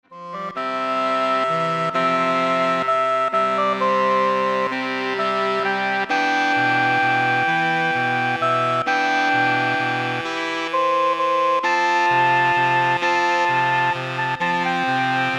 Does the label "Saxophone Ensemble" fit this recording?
Yes